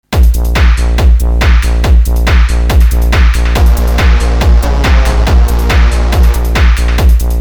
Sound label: bass